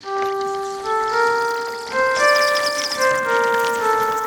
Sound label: Underwater Textures Melody G Minor 112 bpm; MIDI Clips; Tonal; Ableton; Factory Packs; Lost and Found